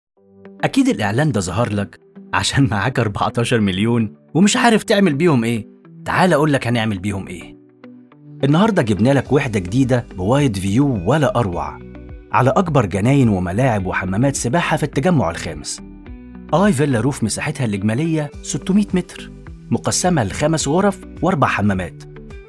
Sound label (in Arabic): بالموسيقى; الطارق للعقارات